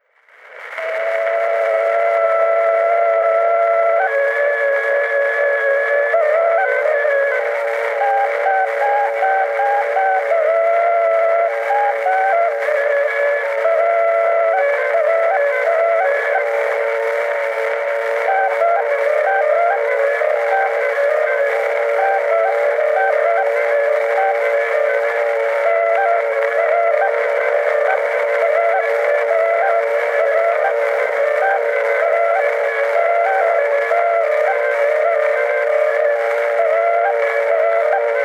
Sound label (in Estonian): Torupilli Jussi lugu, Emmaste, torupill, folk